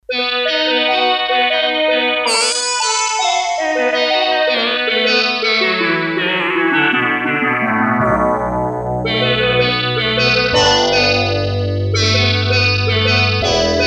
Square Fuzz, Korg DS-8; Factory Patches

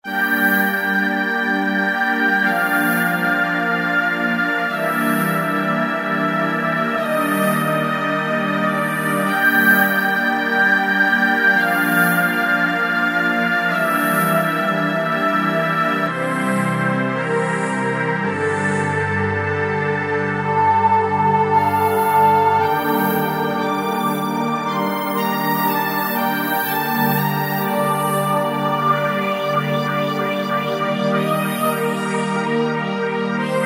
Air Pad, Kurzweil K2000; Kurzweil K2000 Contemporary ROM; Contemporary ROM Programs and Demos diskette; SYNTHTEX

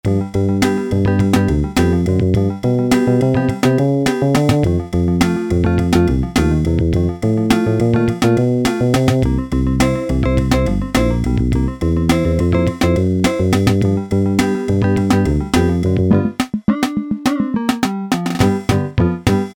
RHYTHM & BLUES, Yamaha SHS-10; Factory style demos

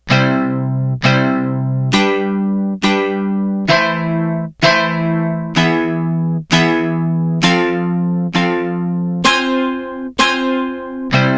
MANDOLIN MAJ MIN CHORDS

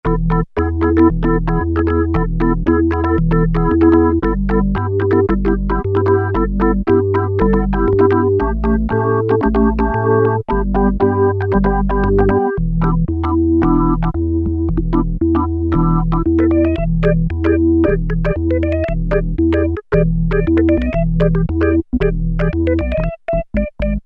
Organ (Fast); Factory Presets Bank B

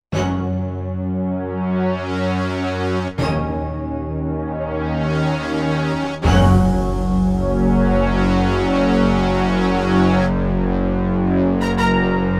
Crescendo

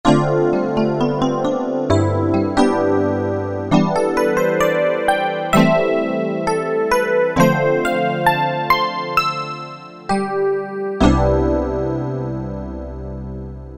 BRIGHT EP, Roland Guitar And Keyboards

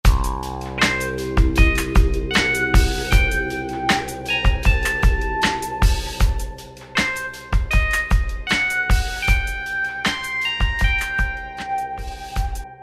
Thin Lead, Roland SR-JV80-12 Hip Hop Collection

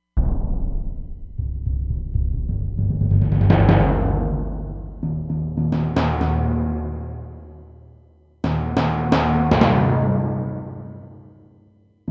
Timpani + Low, Roland Fantom XR

